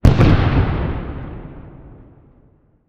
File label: Explosion Mine; Fire and Explosions